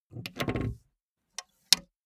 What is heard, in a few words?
car bmw e46 exterior gas cap open